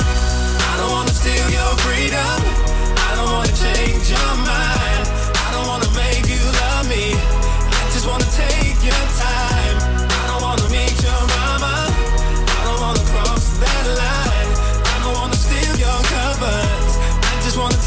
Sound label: funk; multitrax